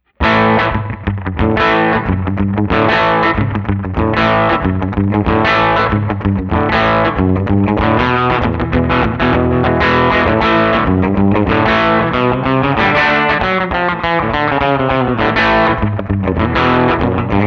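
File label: Rockabilly Bridge, guitar; Guild Starfire III; 1966 Guild Starfire III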